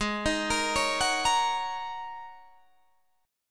Ab 9th Suspended 4th Ab down Guitar Drop D

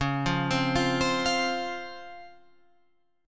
C sharp Major 7th C sharp Guitar Open F; C#M7; arpeggiated

